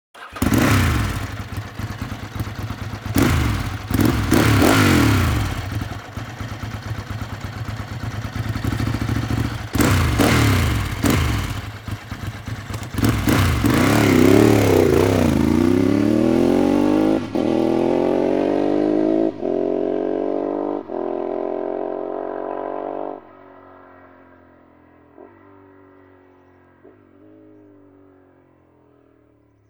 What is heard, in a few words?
Ducati 916 SPS, MC Lyde